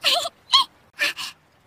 casio ctk sampling giggle fairy

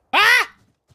scream

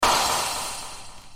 synth slam

